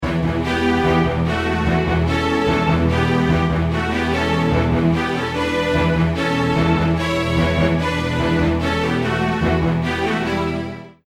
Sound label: bomb ten sec count; halo